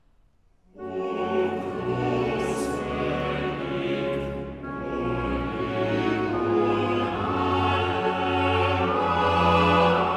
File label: Chorale; O grosse Lieb, o Lieb ohn' alle Masse; Bach; Johannes-Passion; John Eliot Gardiner; SDG